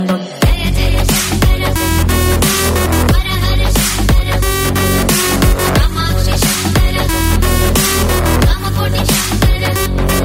hindi mix music ringtone; bollywood ringtones

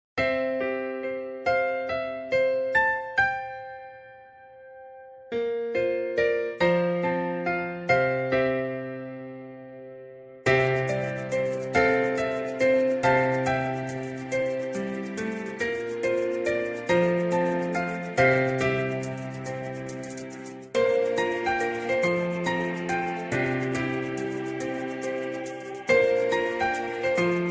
ring ringtone

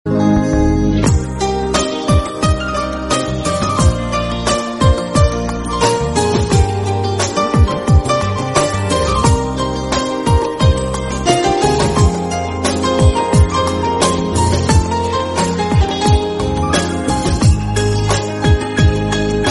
arabic ringtone

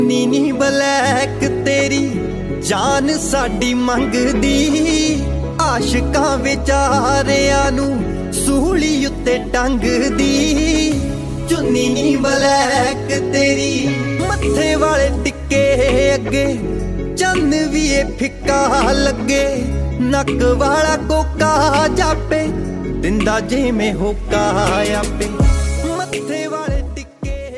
bollywood mix punjabi ringtone

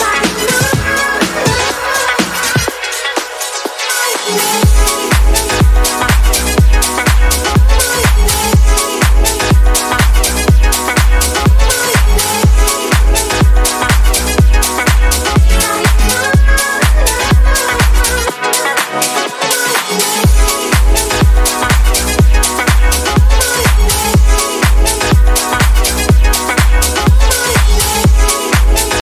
ringtone

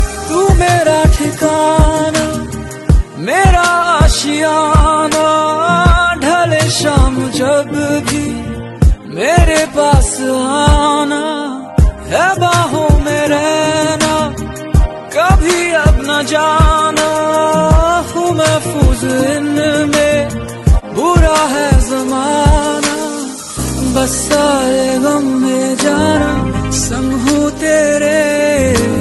love song ringtone, bollywood ringtones

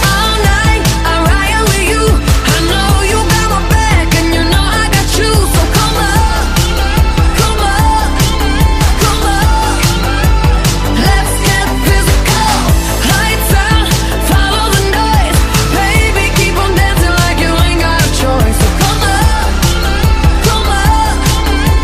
english music ringtone

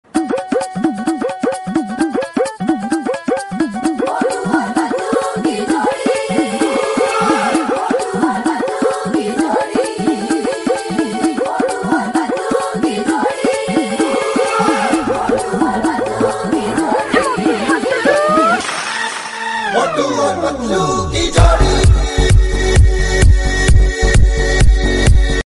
hindi cartoon background music tone

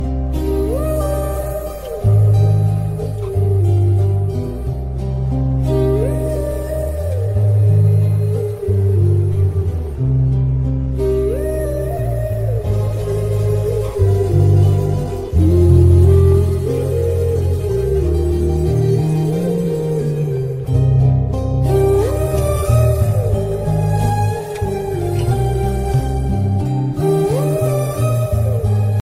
romantic bollywood music ringtone

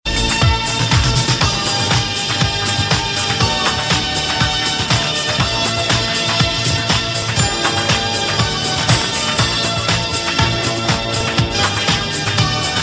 dancing ringtone

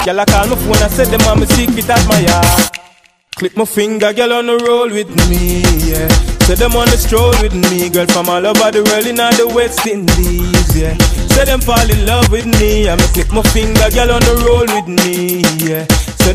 click ya fingers, ringtone

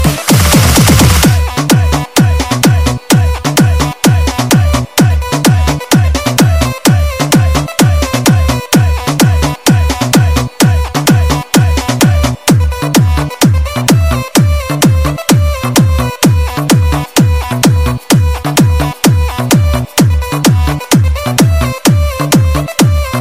english music

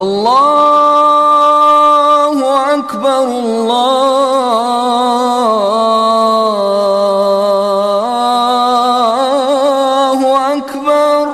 arabic athan ringtone, islamic ringtones

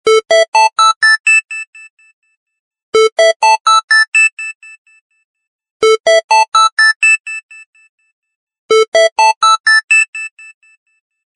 instrumental music tone ringtone

